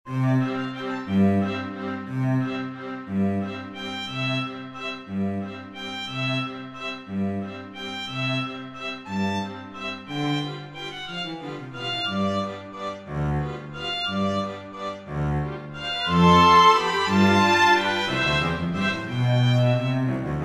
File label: String Quartet